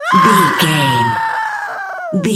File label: voice female scream low